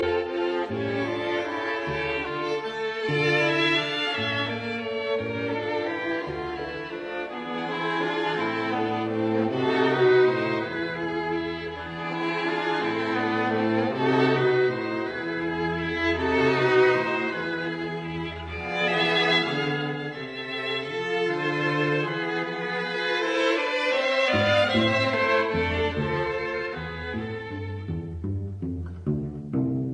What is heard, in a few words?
String Quartet in C major, Andante con moto; Beethoven; Op 59 No 3; Rasumovsky